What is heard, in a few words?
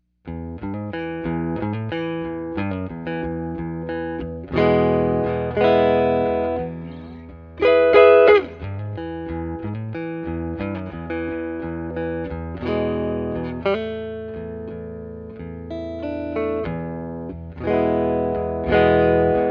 Neck Clean